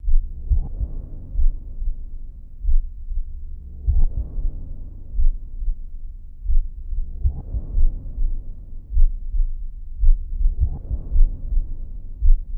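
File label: Ambience Eerie Heartbeat Loop; Lucius III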